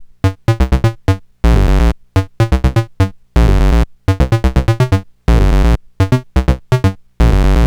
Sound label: Modular Sequence Dry